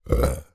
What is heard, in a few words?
human burp deep tummy